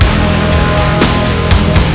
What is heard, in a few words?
win